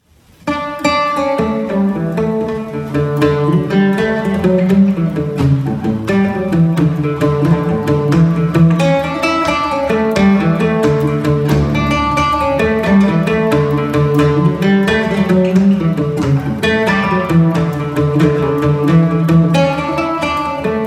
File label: Coolea Jig slow guitar